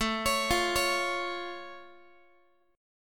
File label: A Augmented A down Guitar; A+ arpeggiated; x,17,16,15,17,x